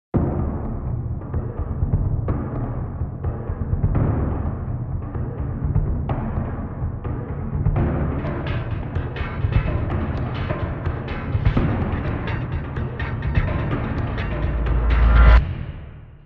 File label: Percussion; Fired Earth Music